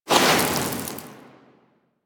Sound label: Sword Elemental Fire Whoosh; Enemies; Weapons; Warframe; Teshin